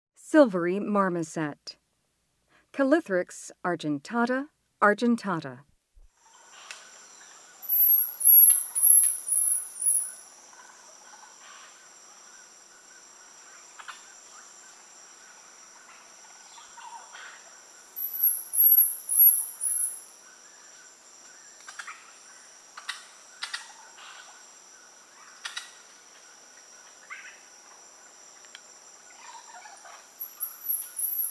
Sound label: Silvery marmoset; S. America; Rainforest